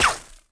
laser Bolt hit; impacts explos

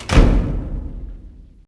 secret door slam; Max Payne; ragnarock

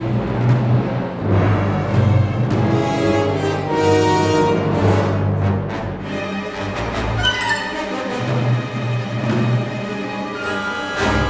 battle, music flourish

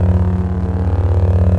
saber hum; weapons

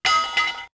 pipe hit concrete; objects; Star Wars Obi-Wan; XBOX

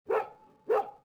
Dog Bark; Soldier Of Fortune II Double Helix; XBOX; finca

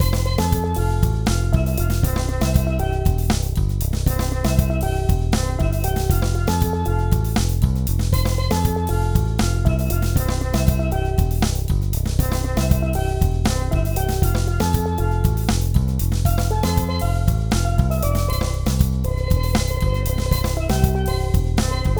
Music; Kingpin Regular; XBOX; AMF Extreme Bowling 2006

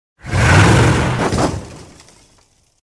truck airborne; Soldier Of Fortune II Double Helix; XBOX; ambience

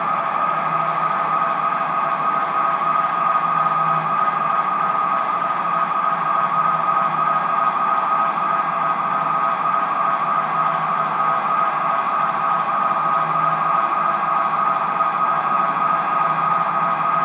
city medium alarm; ambient; Project Gotham Racing

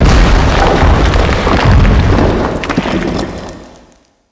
Monolith crashes down; asgard

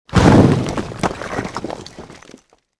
wall smash

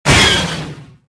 fire; Star Wars Jedi Knight II Jedi Outcast; XBOX; mark1

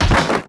droid fall

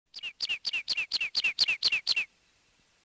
bird; yavin

